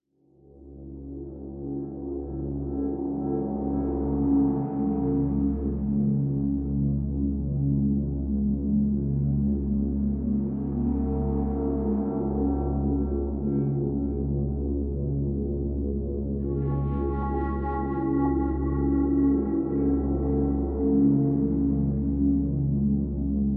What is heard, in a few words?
Downtown Ambient; modern combat 5 blackout; android